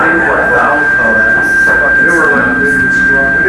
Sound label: tone